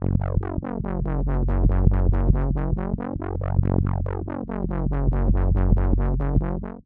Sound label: deep wobble bass 140bpm in fm